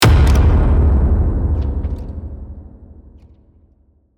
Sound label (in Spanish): atencion impacto